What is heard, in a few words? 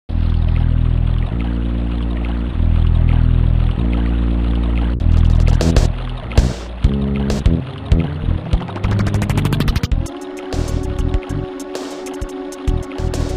Peaceful Rest Valley